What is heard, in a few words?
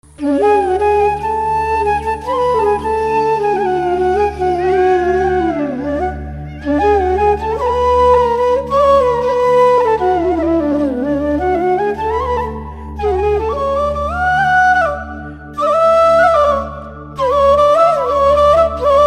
Flute Music Ringtone